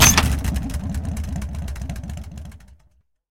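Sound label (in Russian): неисправно работающий глохнущий двигатель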